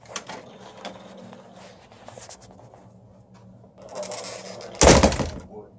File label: east room door